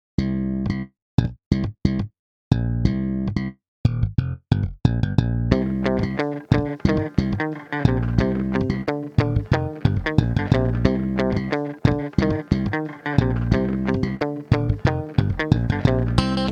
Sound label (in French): musique illusion rythmique sans click 90bpm